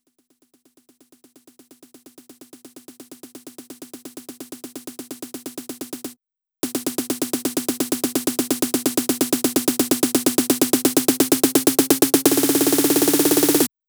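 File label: Drum Loop snare roll, 128BPM; VEC5 Drumloops; VEC5 Multi Loops 128BPM; Loop Elements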